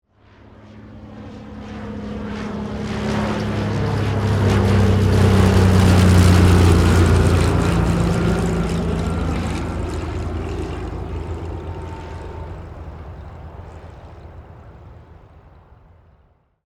Prop Plane Pass By, Transportation, Aircraft, Prop, Plane, Pass, By, Airplane; BlastWave